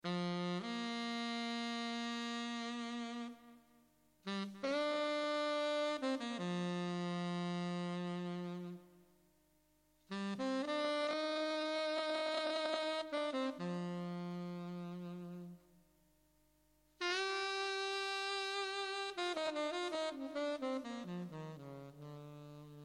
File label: sax solo